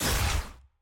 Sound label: aah; naafiri